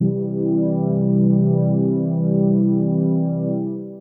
Pad; Jazz (Джаз)

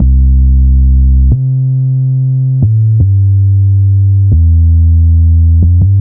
Saw Sinus, ambient

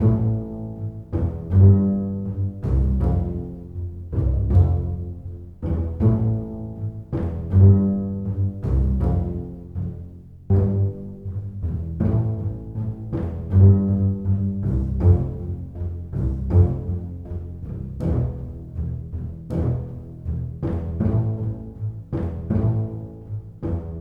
bass pizz; пиццикато

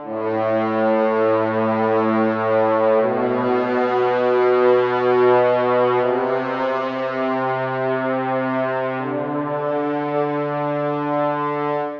Horns, brass

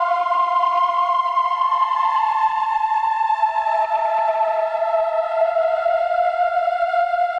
80s Flav Pad; electric jazz

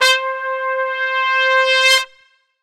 Slow Swell, brass

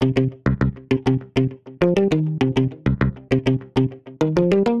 Delay, лупы

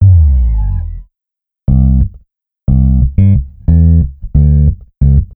Finger Bass, hip-hop

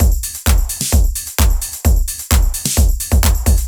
Bryggeri Drums

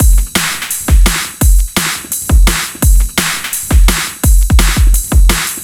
Arena Kit

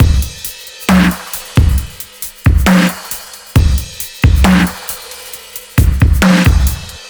Stereo Kit; Dubstep

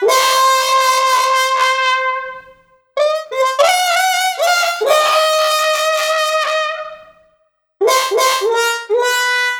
Scream Trump; лупы

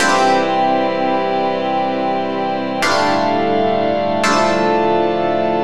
Percy Organ, drum bass; сэмпл клавишных

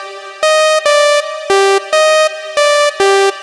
Solo Melo; Сэмпл синтезатора (Техно-транс); Techno Trance; 140BPM; loop; Synth; Solo Melody

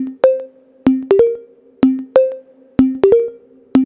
Minipluck, минимал

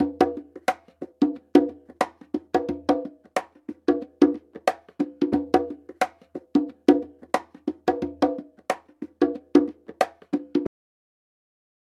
Bongo Conga 90 BPM; Перкуссии (Percussion Loops)

